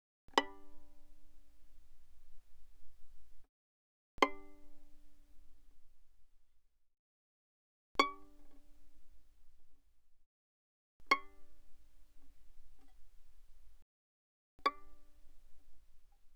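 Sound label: Violin pizzicato fortissimo sul A B5 F6; Strings; violin2012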